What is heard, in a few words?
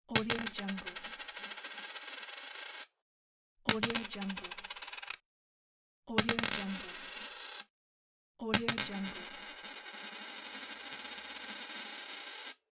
coin